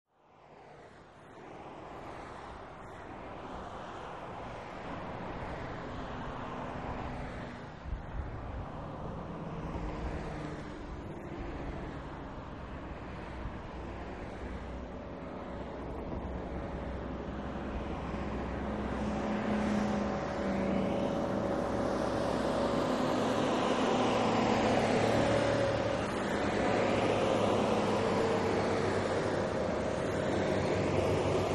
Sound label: Traffic On Freeway, highway